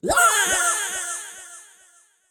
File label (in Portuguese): Morte Grito; Super Mombo Quest